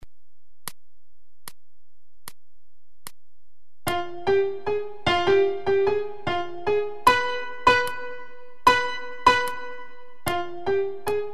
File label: Charleston 75